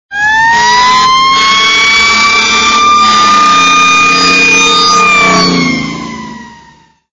siren, Sound Effects Ringtones

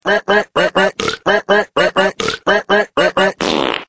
sms mix ringtone; SMS-Ringtones-collection; funny-and-crazy

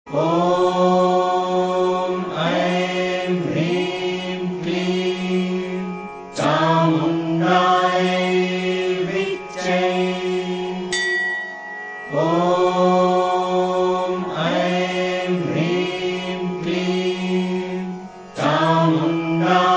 Durga Mantra Spiritual Ringtone; Religious; Devotional; Ringtones

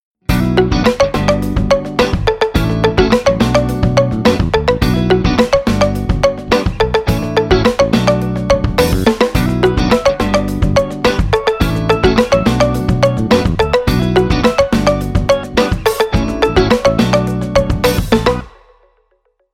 POCO F1 Ringtones

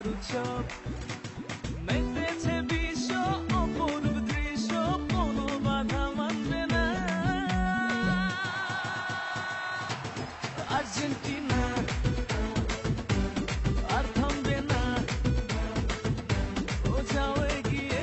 bengli song ringtones

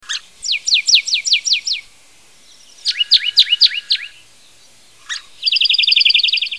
The sounds of birds

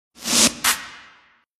Windup; Sony Ericsson W 995; Ringtones

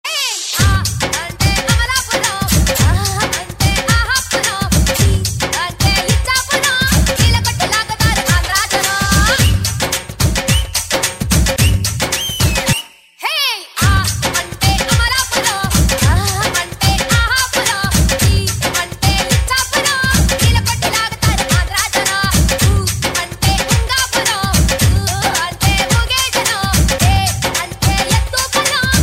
Best Remix Tone, Funny Ringtone; Indian Other Languages Comedy; Ringtones

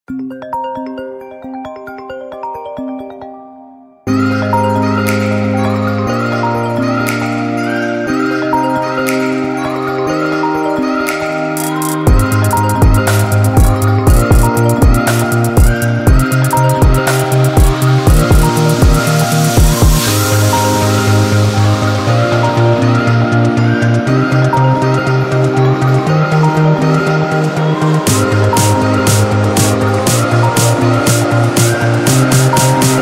iphone 9 dj, Iphone Ringtones

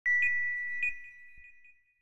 penetrate, notifications; OnePlus 5T Stock Ringtones

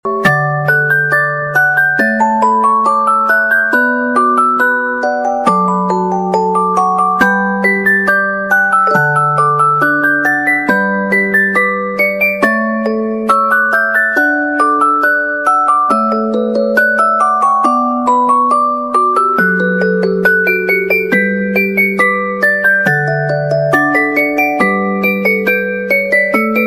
Baby Dreaming, Funny baby ringtones

